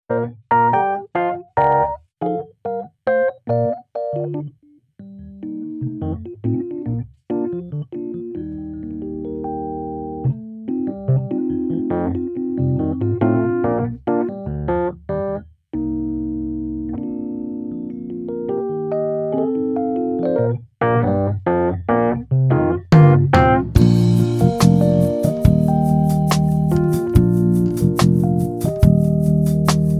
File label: Playback